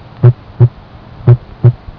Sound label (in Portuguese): S1 aumentado, sons cardiacos